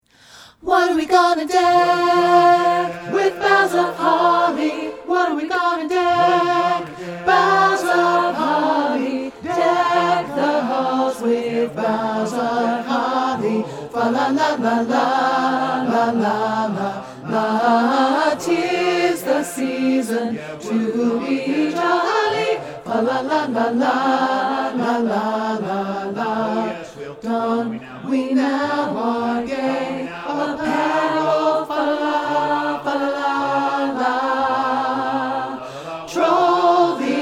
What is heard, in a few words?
DECK THE HALLS TENOR, learning tracks; PAP DECK THE HALLS